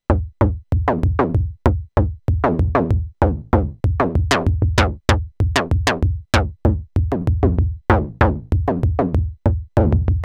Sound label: Behringer Syncussion SY 1 Groove; Mutable Grids